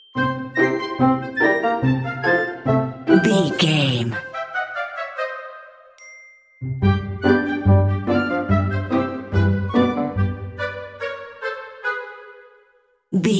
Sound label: woodland critters play bumper